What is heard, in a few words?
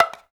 African Perc; Spectrasonics; Supreme Beats; African Contemporary; Afro DRMs